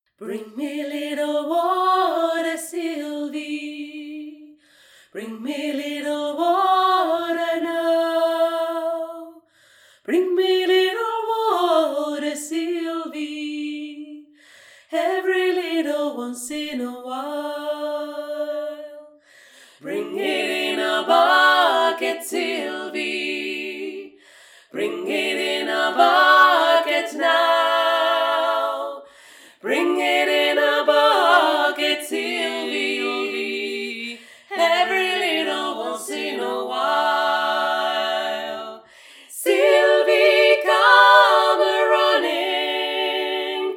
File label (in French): 3 VOIX; BRING ME LITTLE WATER